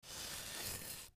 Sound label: MedicalScientific; Soundfx III